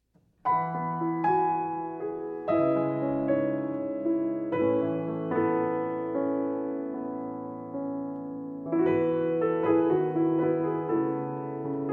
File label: Minuet II (From Violin Partita No. 3 in E Major, BWV 1006)